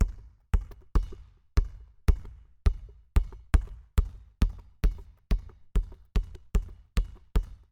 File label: basket dribble